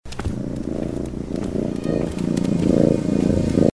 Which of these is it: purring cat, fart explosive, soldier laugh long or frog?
purring cat